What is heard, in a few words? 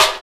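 hyper snare; Drums In Your FACE!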